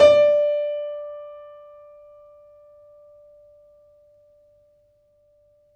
FF D 4, Grand Piano off mic; AKAI S6000 CD-ROM - Volume 2

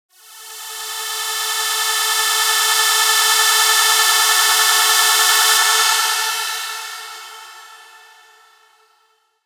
Pad; neuro; Stanza

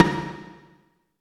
Toms, Elektron MachineDrum SPS1 MKII

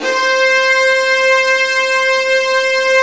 Vas2 Bright; Roland L-CD702; Vlas Sect1-2

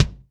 HR16 BD; Crypton - DrumBase5000

